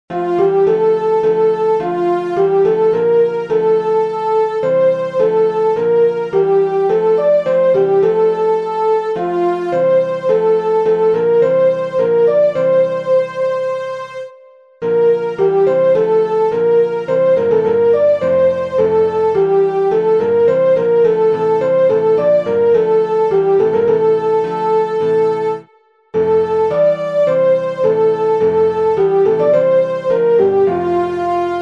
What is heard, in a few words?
soli deo gloria keyboard